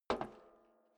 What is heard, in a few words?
step boots metal rattle single right; schritte; Footsteps